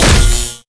neon sign hit, weapons; tf2

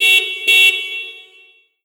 car horn; Garry's Mod; tf2; mvm warehouse

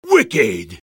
sniper cheers

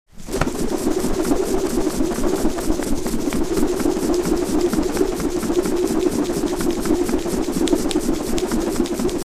spining; saturn

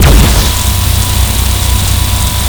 laser deathray; lighthouse moon; lighthousemonster

